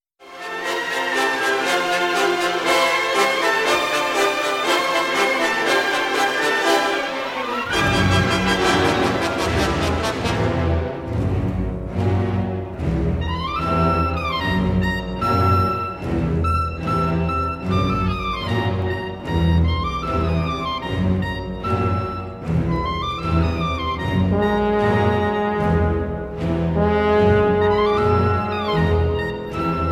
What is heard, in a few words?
excerpt from Petrouchka, tuba